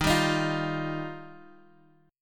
D sharp Major Add 9th D sharp, chord

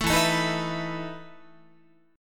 E Major 7th Suspended 4th E down Guitar; EM7sus4 strummed

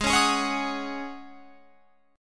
Ab 7th Suspended 4th Ab down Guitar Open F, Chord; Ab7sus4 strummed

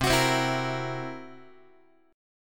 C 7th Flat 5th C down Guitar; C7b5 strummed; JGuitar

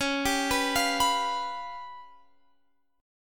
C sharp 7th Flat 5th Guitar Half Step Down, chord

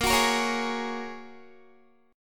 Bb Minor 7th Sharp 5th, Guitar; Bbm7#5 strummed

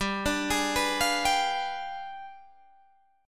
G 7th Flat 9th, Guitar, Open D

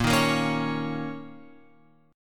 A sharp Minor Double Flat 5th; A#mbb5 Chord {6 6 8 6 X 9} strummed

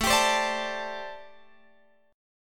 A Minor 11th A Guitar Open F; x,16,19,19,17,16; strummed; Am11

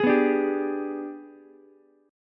Ab Minor Major 7th Flat 5th, down, Ukulele; AbmM7b5; strummed

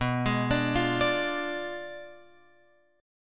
B Diminished 7th, down, Guitar, Open F; Bdim7 arpeggiated

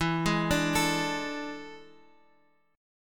A Major 7th Suspended 4th E

